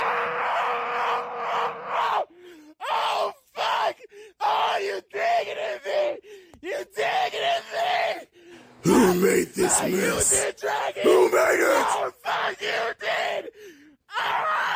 digging

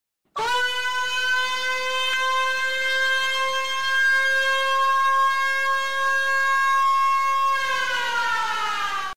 the alarm button

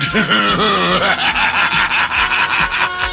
laugh

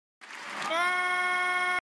glitch; ytp

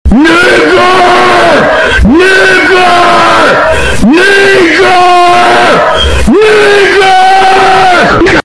neck hut

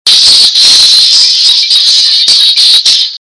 logo video

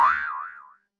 regular boing

